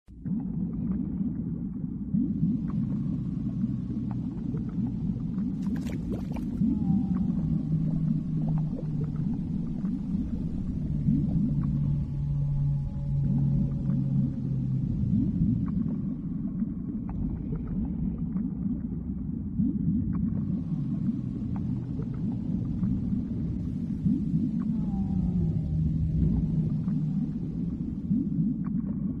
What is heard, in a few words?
atmosphere underwater